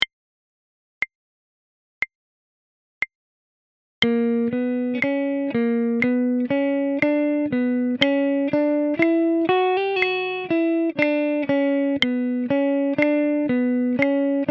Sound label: 60bpm, guitar